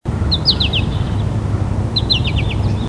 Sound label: goldfinch, bird sounds